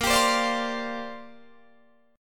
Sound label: A sharp 6th Add 9th, Guitar Open D